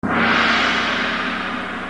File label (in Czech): gong